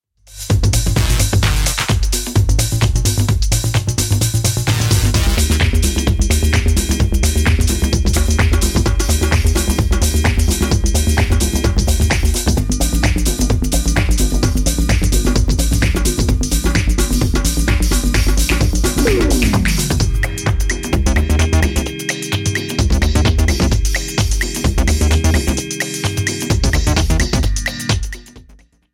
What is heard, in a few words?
drumtalk